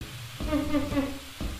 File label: laughing; Lethal Company; Bracken